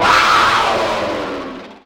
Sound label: mountain lion sounds; big cat